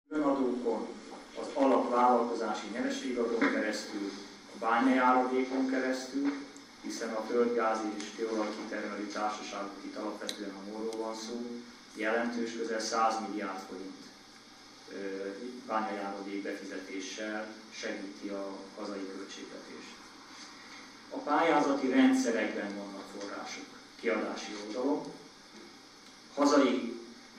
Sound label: digitar